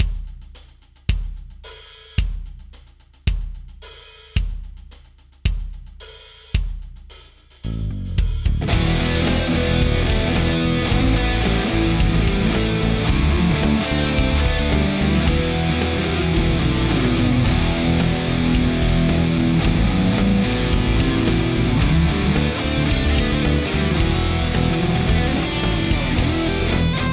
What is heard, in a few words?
Power Chord Jam, guitar; intro to guitar